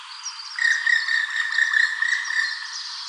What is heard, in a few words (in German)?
Schwarzspecht; Zell am Main; Rainer Jahn